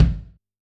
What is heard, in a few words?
Kick, drum